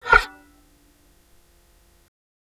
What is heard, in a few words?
Violin Extended Technique